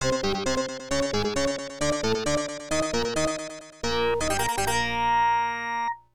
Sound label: Goal; Blades of Steel; arcade